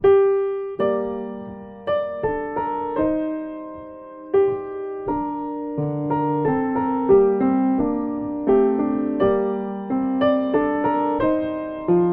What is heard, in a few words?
Old Christmas Carol g moll; Franck